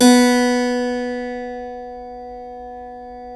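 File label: B2 clavinet right, Keyboards Acoustic; USB Soundscan vol.17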